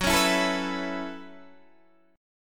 Gb Minor 11th Gb Guitar DADGAD

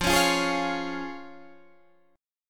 C sharp Major, chord; 13,16,15,13,14,13

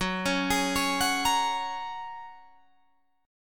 F sharp Major Add 9th, Guitar Drop D

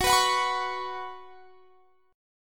Gb Suspended 4th; Gbsus4 strummed; x,21,21,23,24,21

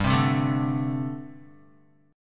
G Suspended 2nd Suspended 4th, Guitar; Gsus2sus4; strummed; 2,3,0,2,2,x

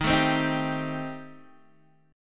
Eb 6th Flat 5th Eb down Guitar DADGAD

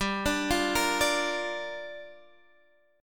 G Minor 7th, Guitar, Standard; Gm7 arpeggiated; JGuitar

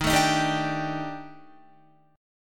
Eb Major 7th Flat 5th Eb down Guitar; strummed; chord

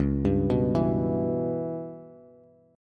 Db Minor 7th Flat 5th Db down Bass; arpeggiated

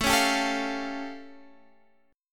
A Augmented 7th A Guitar Standard; strummed; x,12,11,12,10,13; JGuitar

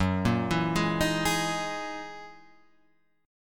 Gb 13th Gb down Guitar Half Step Down

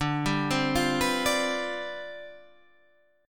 C Major 7th Suspended 2nd Suspended 4th D, chord

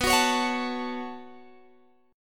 B 7th Suspended 2nd, Guitar, Open G

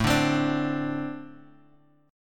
A-Minor Double Flat 5th A; Ambb5 Chord {5 5 X 5 3 X} strummed

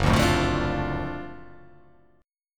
F sharp Minor Major 7th Sharp 5th

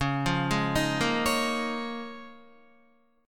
C sharp 6th Add 9th C sharp down Guitar; arpeggiated; C#6add9; JGuitar

